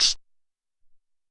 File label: Noiz Hat, Alesis SR-18